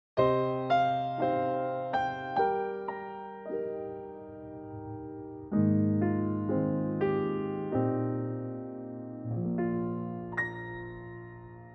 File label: piano Eb to E; key - Eb to E - vocal range - Eb to E; Wonderful song from the musical "Ragtime", in a very lovely piano only arrangement that builds